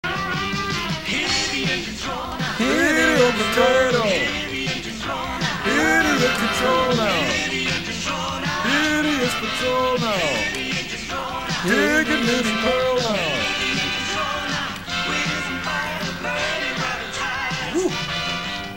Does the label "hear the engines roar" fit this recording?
Yes